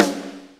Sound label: HARD RM SN, ACSTC DRUMS; Pro-Rec - Synthsonic